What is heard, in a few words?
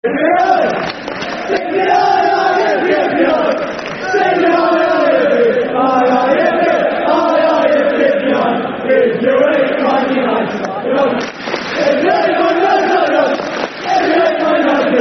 Football Chant, Soccer Songs; If You Hate Man United; Oldham Athletic Oafc